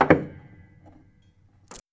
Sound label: PERC FX